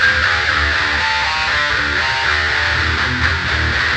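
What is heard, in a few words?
Guitar (metal)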